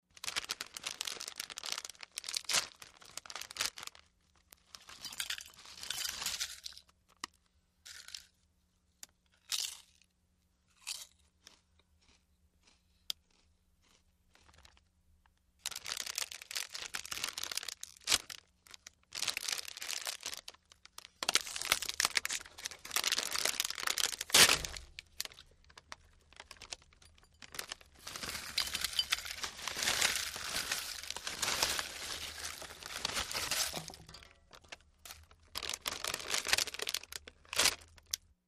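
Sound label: Potato Chips Open Bag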